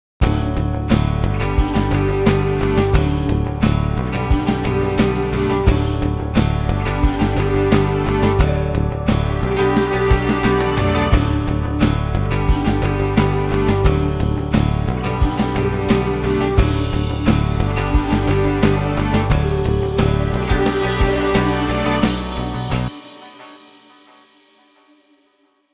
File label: mix pop